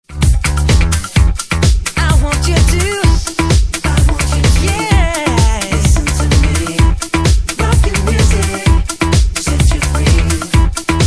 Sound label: Rocking Music